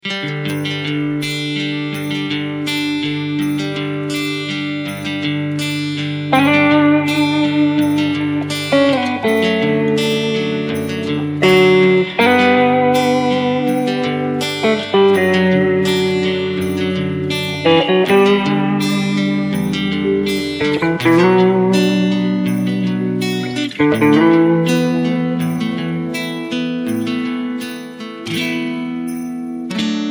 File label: D No Backing Vocals